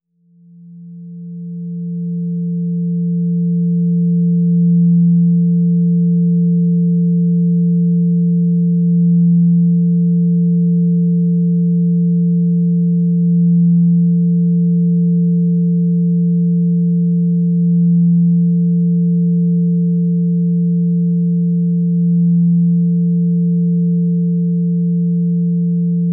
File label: Relaxation Music